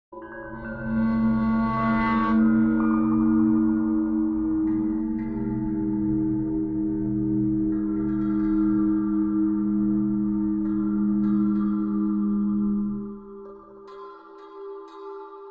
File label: Metal Ring Aura Drone Magic Ambient